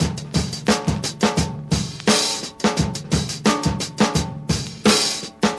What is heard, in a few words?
86 bpm breakbeat e key, Free drum loop sample - kick tuned to the E note. Loudest frequency: 2503Hz